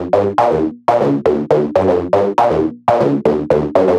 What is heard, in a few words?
Techno Synth; Sonic Foundry (Sony Creative Software); Trance NRG VOL-2; Techno Synths